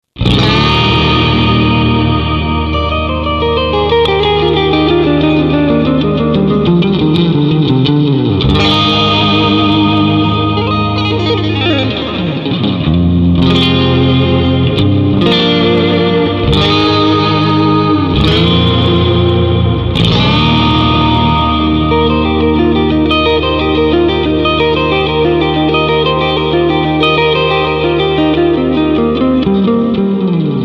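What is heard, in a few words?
cyborg reverb stadium; rocktron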